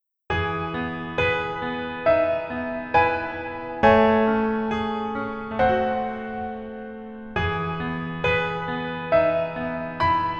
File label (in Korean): Piano ver 멜로디MR 여자키